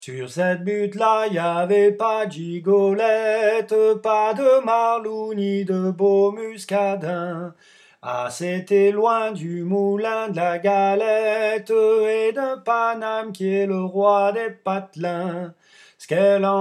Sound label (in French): Voix medium; Butte rouge